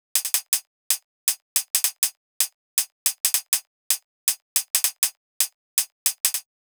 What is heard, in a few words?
160 outro hihat